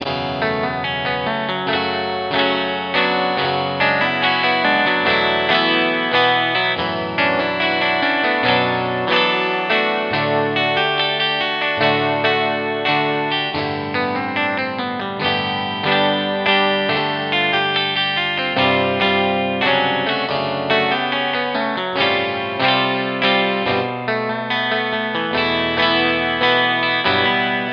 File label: Classic Guitar